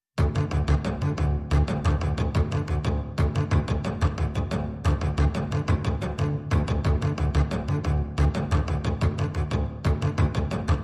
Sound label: Pulses Only